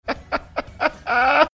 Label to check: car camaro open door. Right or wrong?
Wrong